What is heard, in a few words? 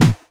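snare drum bass drum, Dance Mega Drums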